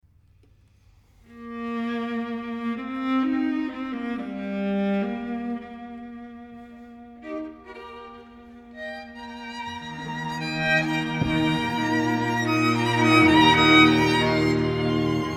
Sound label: String Quintet; Dvorák - Quintet for strings in E flat-major op. 97; Festival Montalcino 2008